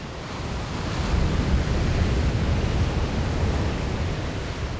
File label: Water waves Loop; 1944 Battle Of The Bulge